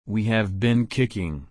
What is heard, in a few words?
kick